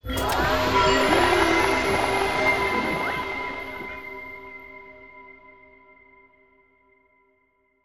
UI GET DIARY

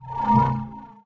Ufo Turn

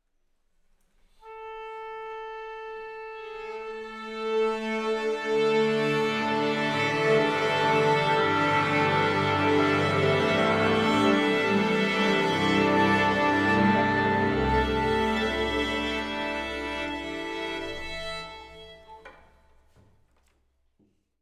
Orchestra Tuning; Invisible Hours

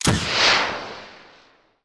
tools launcher; teardown; ps5 windows xbox series xs

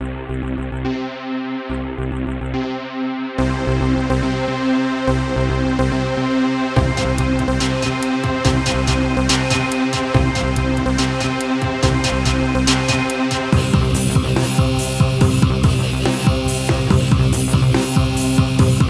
synthrock; Avoid Sensory Overload

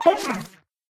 Cypig Collision; Sfx - Cypig Collision 02

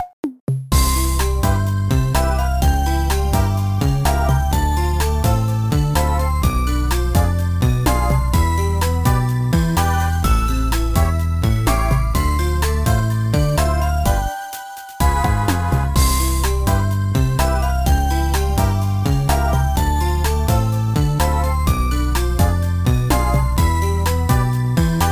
Notes (Record Reading BGM); Eijukugo Target 1000 DS

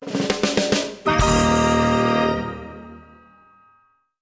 Win Sting; curious george downtown adventure macos windows gamerip 2002

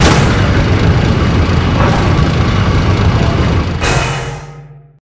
gas pump

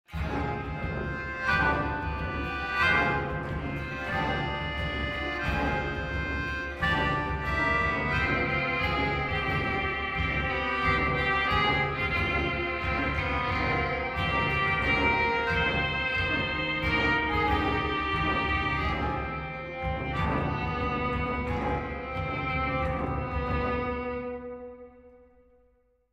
Instrumental

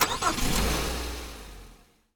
vehicle small ignition; teardown ps5 windows xbox series xs gamerip 2022

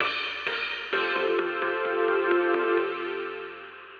TV news outro; teardown; ps5 windows xbox series xs

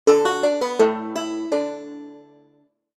Cowboy Banjo Chords

